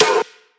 wet snare drum a key, Royality free acoustic snare tuned to the A note. Loudest frequency: 2442Hz